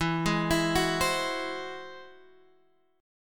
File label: G sharp Augmented 7th E